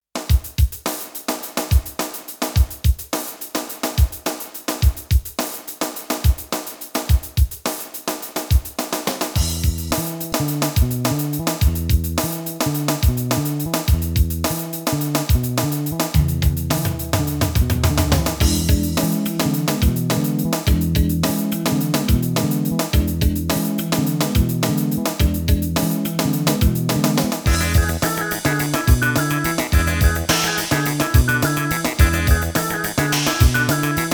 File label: Song (Instrumental); Spotlight 2